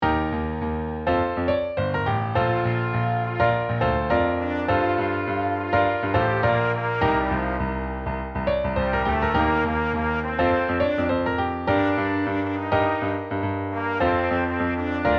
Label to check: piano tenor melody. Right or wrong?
Right